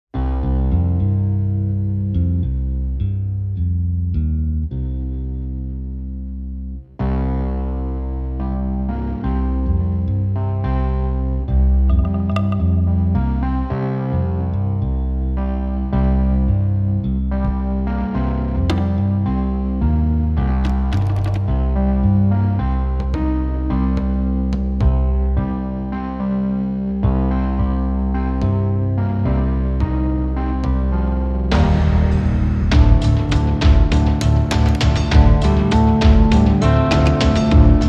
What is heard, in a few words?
APPROACHING